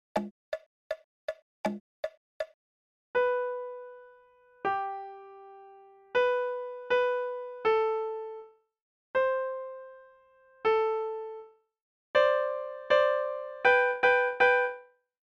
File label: Accomp; A Sailor Went To Sea